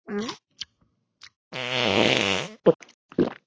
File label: Farting food swallow fart